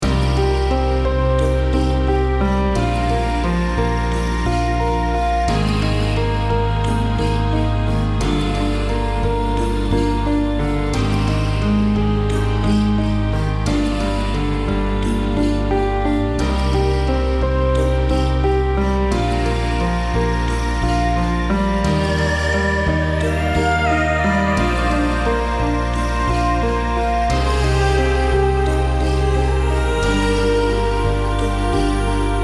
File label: Healing Melody